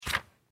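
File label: quickly turned page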